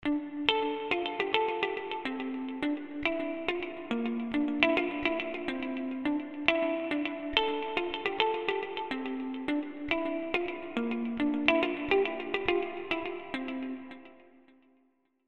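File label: D minor 80 bpm, Reggae MIDI Melody; Villain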